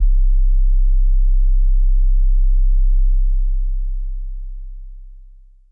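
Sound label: BD Sub, bass drum; Takebeat; Club; VEC1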